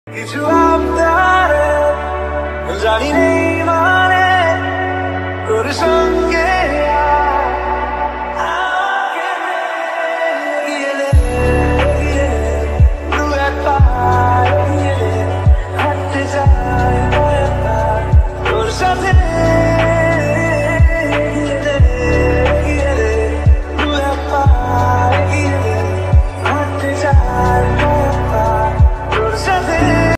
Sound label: bengali ringtone